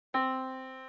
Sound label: yamaha Disk